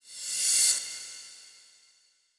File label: Cymbals Reverse Crash; Vengeance Samples